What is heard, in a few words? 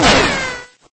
Boom